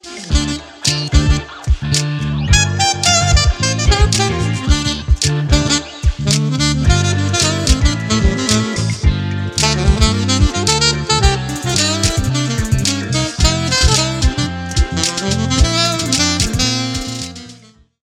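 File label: Alto, sax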